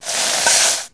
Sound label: deliver order; SpongeBob Diner Dash